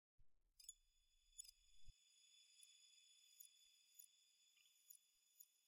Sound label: eyeblossom idle; minecraft 1.21.5; block